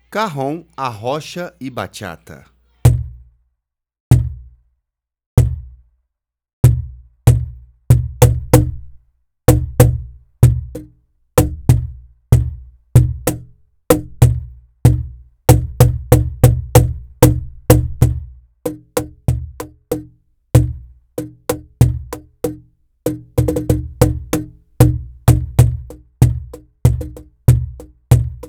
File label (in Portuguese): Cajon